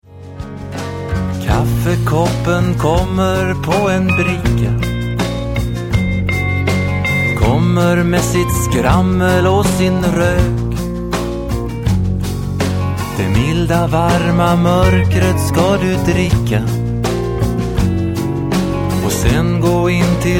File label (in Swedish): Musik